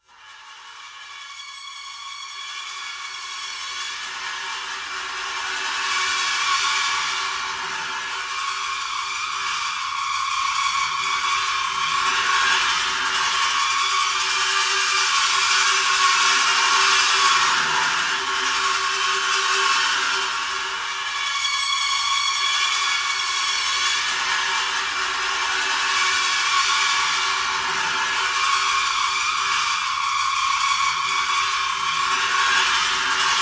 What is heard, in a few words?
Mine Tension; Outlast 2; PS4 Windows Xbox One